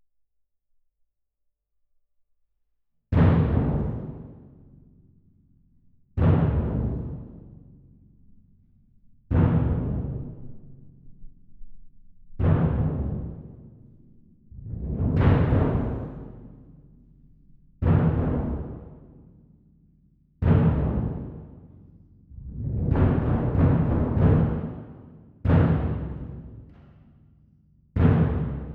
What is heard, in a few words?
Twin R Perc